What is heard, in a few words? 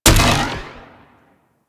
Swarm Cannon Fire; Warframe; Weapons; Corpus